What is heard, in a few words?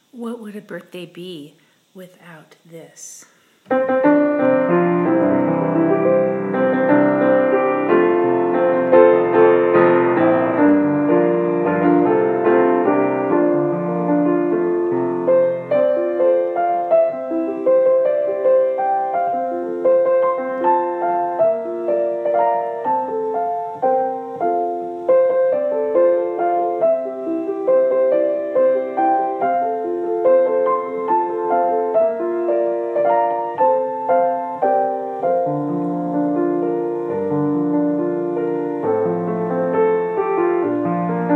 happy birthday piano